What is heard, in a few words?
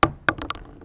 wood; Mafie 1